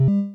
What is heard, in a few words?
Noise On Quiet; TaSTT